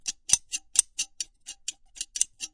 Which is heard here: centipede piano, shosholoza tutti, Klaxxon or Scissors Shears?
Scissors Shears